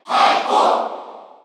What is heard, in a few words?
Cheer Korean; SSBU